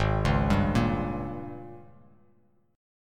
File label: Ab Major 7th Suspended 2nd Suspended 4th; AbM7sus2sus4; 4,4,5,3; arpeggiated; chordshape; JGuitar